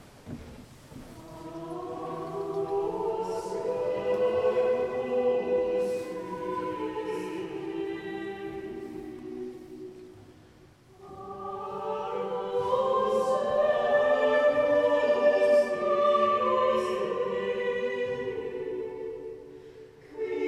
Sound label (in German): Ensemble Kirchenmusik Agnus Dei; Ltg Ulrike Weidinger; aus der Missa a tre Johann Wenzel Kalliwo